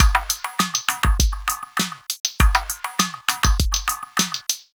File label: Tabla Metro Beat; Sonic Foundry (Sony Creative Software); Myths of Technology; Composite Beats